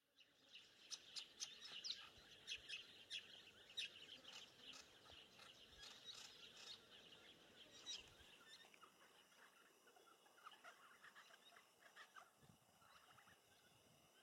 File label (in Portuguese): canto do tagarela de Hall